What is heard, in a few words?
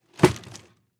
Impact Radio Dropped In Dirt